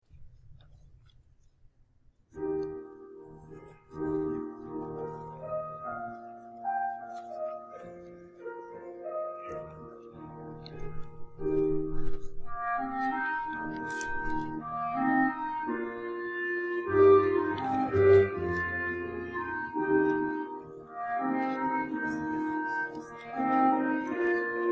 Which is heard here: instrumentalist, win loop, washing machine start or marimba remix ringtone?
instrumentalist